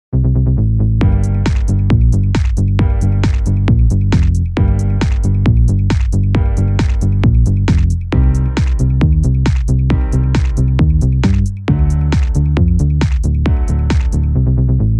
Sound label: Instrumental